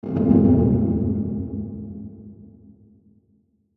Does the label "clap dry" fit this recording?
No